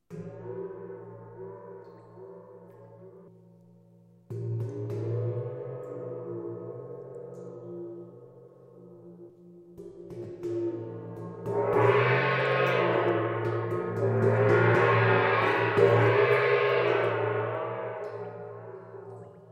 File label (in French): gong eau long